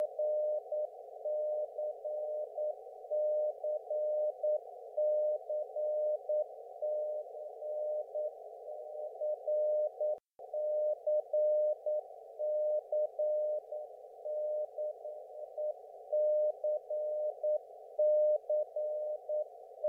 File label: Channel Marker CW; 16332khz